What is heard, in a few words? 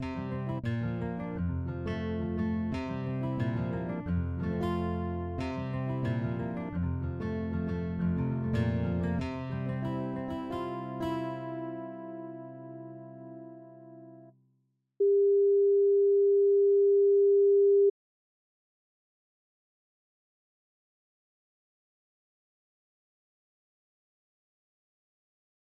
Guitar Intro